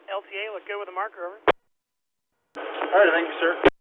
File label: Roll By